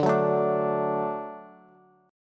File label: D Major D down Bass; D strummed; JGuitar